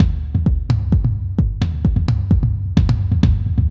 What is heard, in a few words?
Beat